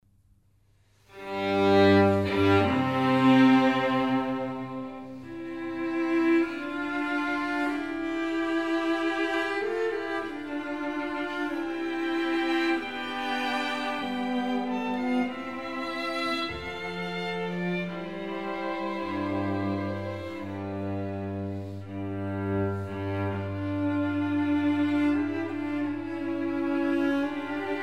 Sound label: Quartet in C, String Quartet; Haydn; Op. 54